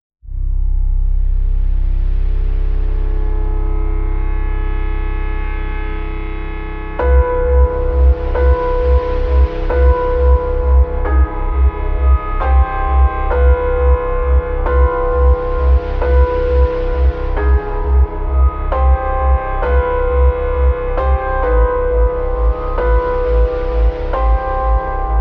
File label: Dark Spooky Deep Piano and more; CUES for film TV games etc